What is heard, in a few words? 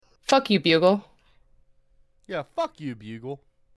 bugle